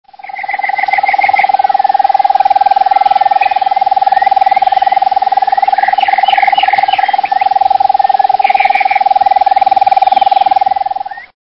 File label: Lesser Nighthawk